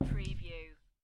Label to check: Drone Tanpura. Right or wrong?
Wrong